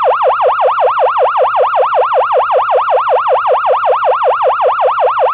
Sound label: yelp